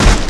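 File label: Wood Ship Hit; Civ4 warlords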